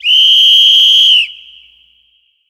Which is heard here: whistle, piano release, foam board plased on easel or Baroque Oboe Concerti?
whistle